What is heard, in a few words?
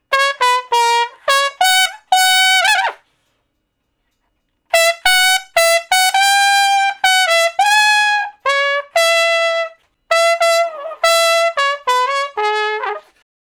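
Trumpet Slow Blues (Db IV, IV, I, I) pickup 1 beat; Crimson Blue and Fabulous Horncraft 4 RnB; Horncraft for R&B; Solos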